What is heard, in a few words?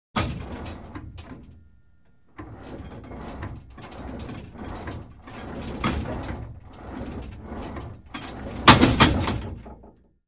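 Gym Weight Equipment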